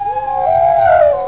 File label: HOWL; ANIMALS